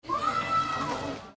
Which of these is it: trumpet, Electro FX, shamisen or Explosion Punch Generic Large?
trumpet